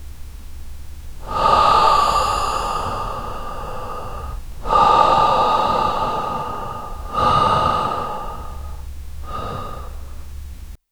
a long relaxing exhale of, breath

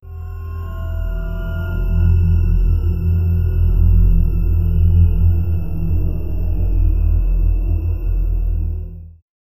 a low barely audible vibr, vibration

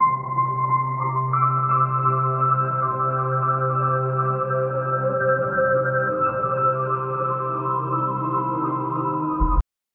a distant ambient melody

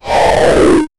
weak mutated monster jump, strained

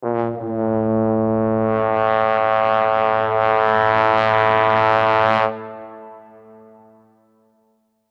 a single sustained trumpe; brass

